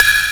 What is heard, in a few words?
WBl, korg drums; Korg Electribe; ESX-1; electribrary-3